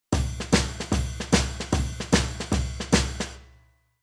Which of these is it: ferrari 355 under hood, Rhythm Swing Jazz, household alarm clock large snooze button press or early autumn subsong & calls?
Rhythm Swing Jazz